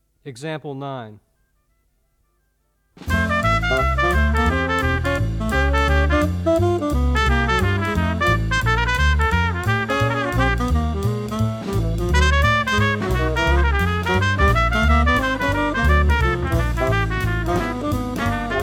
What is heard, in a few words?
two horn audio examples